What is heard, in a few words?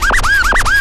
Scratch Out; Proteus 2000; Digital Sound Factory Proteus 2000